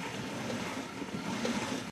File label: Box Move; raw game audio files; MiSide